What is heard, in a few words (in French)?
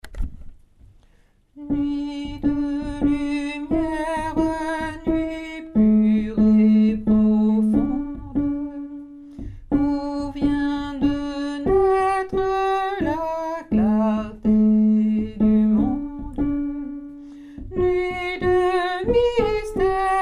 nuit de lumiere hommes; nouveaux noels; chants noel